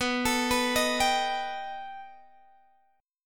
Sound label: B Augmented 7th B down Guitar Open G